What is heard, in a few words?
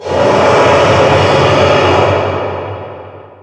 roar distant; barioth